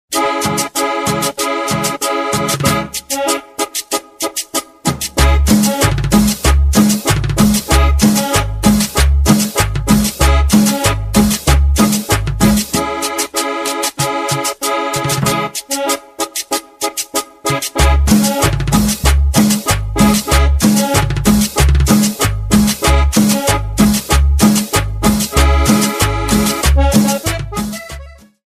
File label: Cumbia